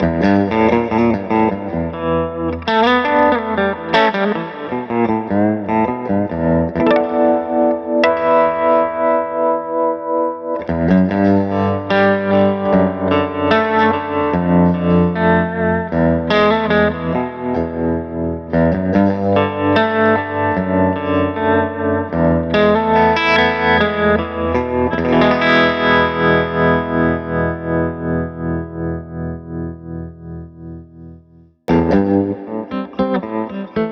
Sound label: Tremolo New EQ